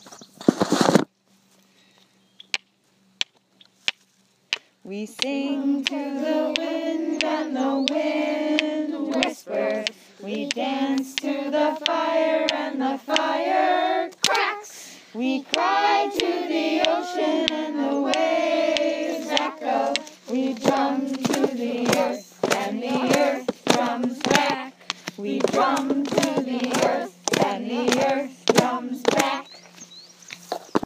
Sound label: Wind Whispers